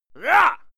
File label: male fight rah; schreie; menschen; soundpool